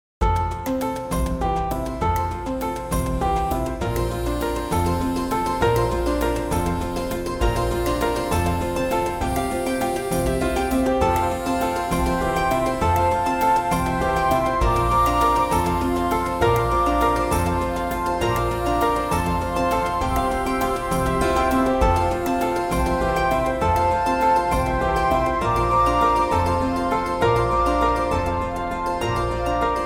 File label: Happy Family Music